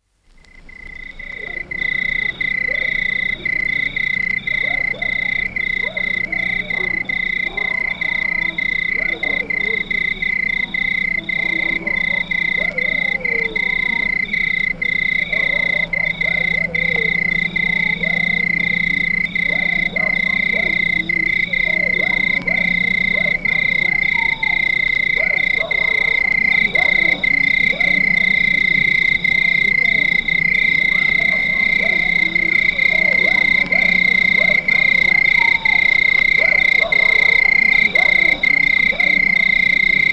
Crickets At Night With Dog Barking In the Distance; Dr. Sound Effects; 210 Nature Sounds - 20 Hours of Relaxing Natural Ambiences for Meditation and Sleep